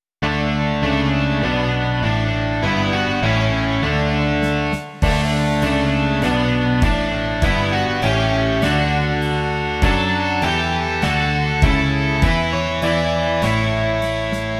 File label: rock